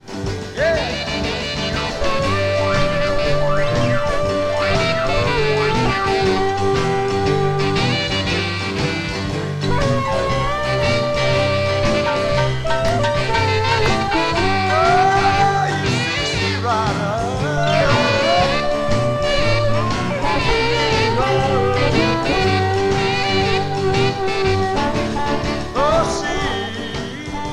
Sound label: Harmonica part